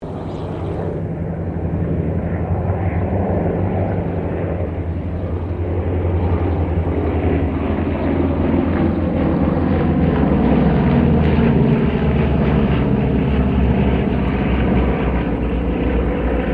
unknown propellar twin engine squadran pass, Airplane; Various unidentified aircraft